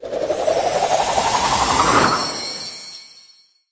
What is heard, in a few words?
char roll out